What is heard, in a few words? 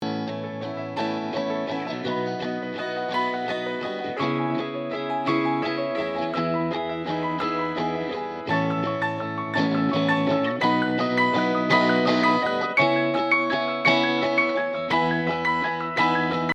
Eqd Stereo Guitar and Piano